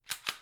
Rifle reload

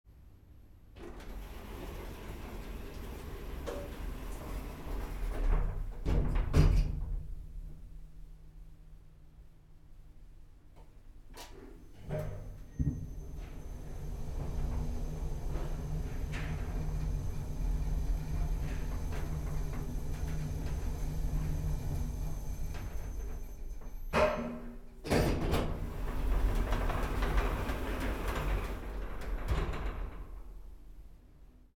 Elevator Down

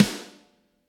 Shotgun Snare

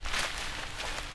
Rustling Foilage

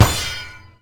Shield Guard

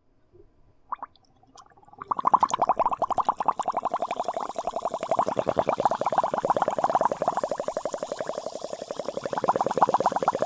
Bubles on soda

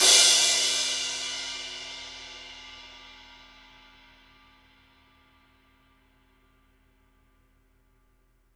Crash Z Custom Projection 19 High Vol

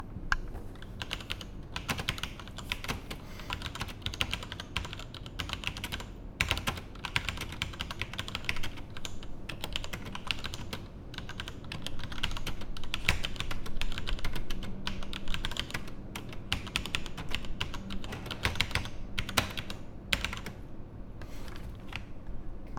Keyboard

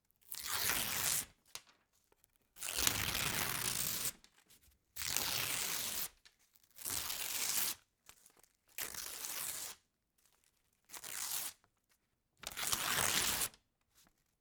Thin Paper Rip

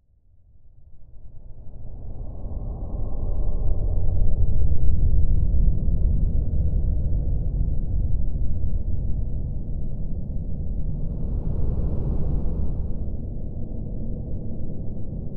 Horror Whoosh, Hydrophone; Golven Langs

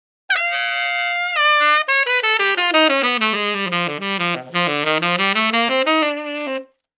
90bpm Wrong Key

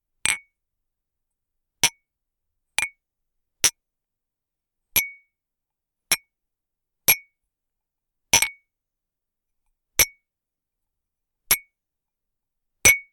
Toast Glasses Trinquer

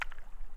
Rocks

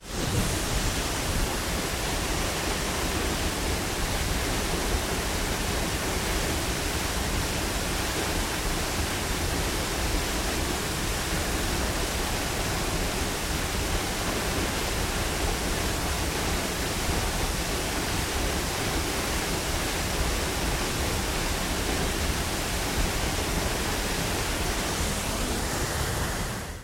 Waterfall

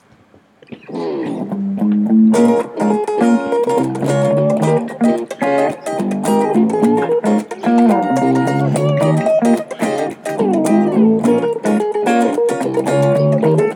Funk Guitar

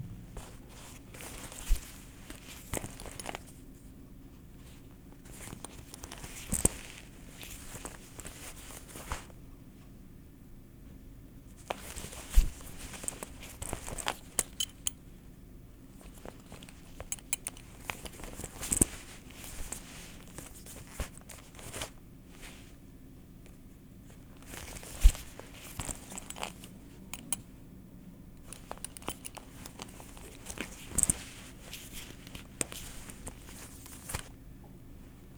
Leather Belt

